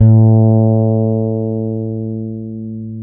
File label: bass, A 3; Spectrasonics; Bass Legends CD 1